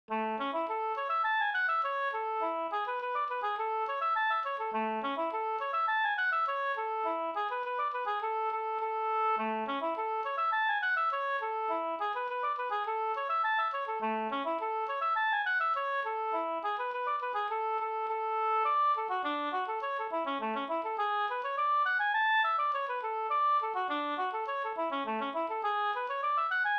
polka polkett; 71an av Goras Anders Ostbjorka

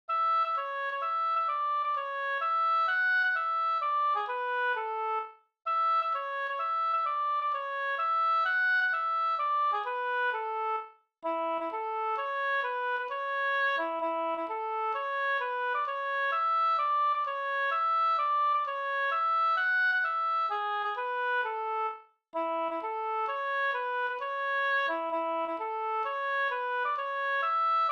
slängpolska

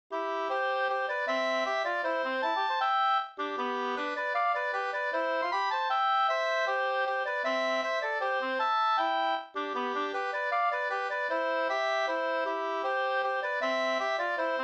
schottis; Vasterljung; Curt Tallroth

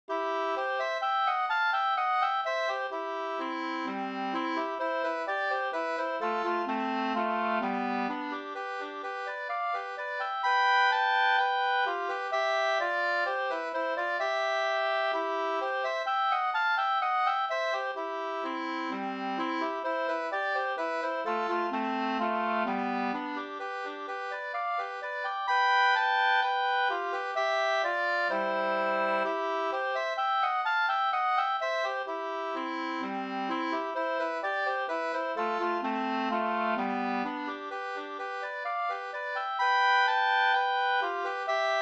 ganglat; av Helge Lindell Trosa Sd

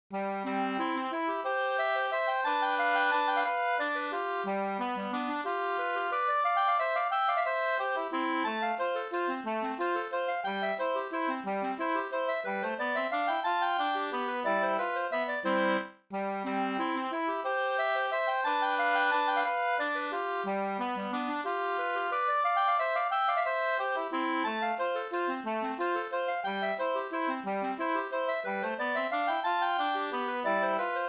vals

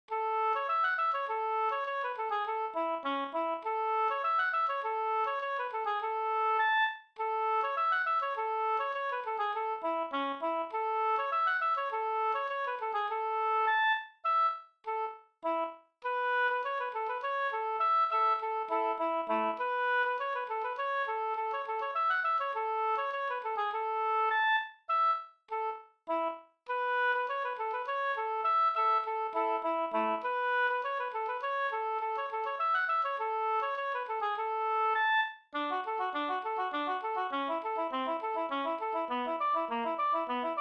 slangpolska

